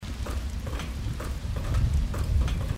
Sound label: walk metal; dishonored